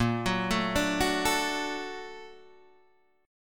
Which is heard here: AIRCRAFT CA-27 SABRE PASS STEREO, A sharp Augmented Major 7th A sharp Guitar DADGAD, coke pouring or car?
A sharp Augmented Major 7th A sharp Guitar DADGAD